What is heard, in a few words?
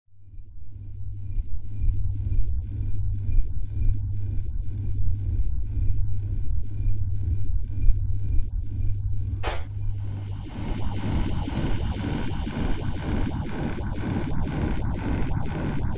An Engine Failure; 384 Sound Designs